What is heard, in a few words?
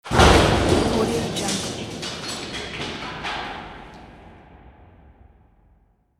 demolition